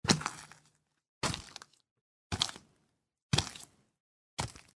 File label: step